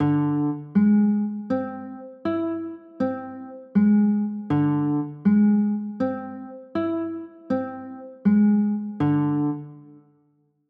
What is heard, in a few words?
b flat 9 sharp 11, chords